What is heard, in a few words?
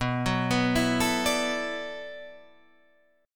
B Minor 11th B